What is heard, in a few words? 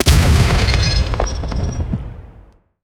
primal critical success, ritual